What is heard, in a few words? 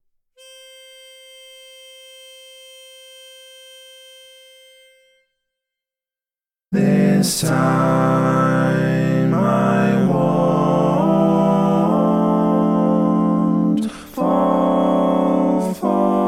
Bass, barbershop tags